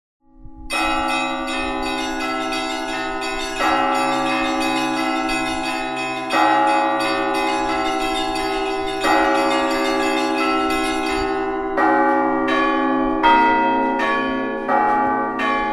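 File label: kolokolnyi zvon, bell ringing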